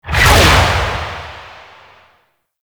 Charge; Warframe; Infested Lich; Hard Drive

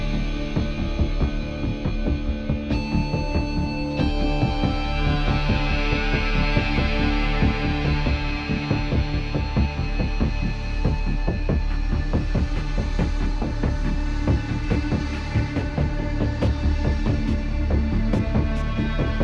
Interrogation Cue; Warframe; NineteenNinetyNine; Stage2Interrogation; Drifter

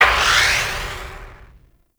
Glaive Throw Start, Infested; Warframe; Zealoid Prelate

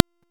Cloak Remove Vocal; Warframe; Frumentarius

